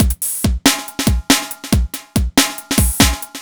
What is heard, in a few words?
Synth Loop BPM140